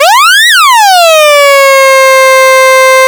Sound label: MEMORYMOOG